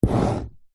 office pen ball point draw line short paper on plastic table